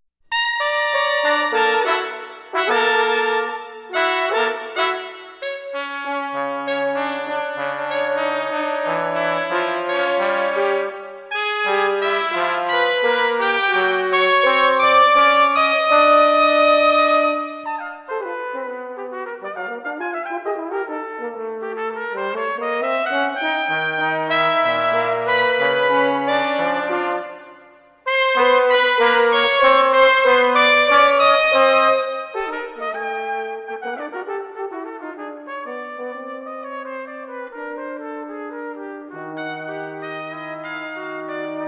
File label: Miniatures for Brass Quartet I Agitato